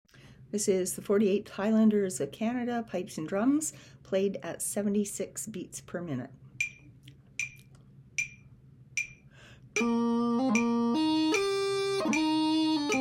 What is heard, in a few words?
Pipes and Drums